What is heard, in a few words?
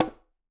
Bongo L Slap; Latin Percussion; Drums; Drum Hits; Ableton; Factory Packs